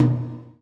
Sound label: GATE TOM, Drums Acoustic